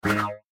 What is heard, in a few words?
Flip; Google Snake